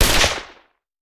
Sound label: Hand Gun; Sound Ideas; Guns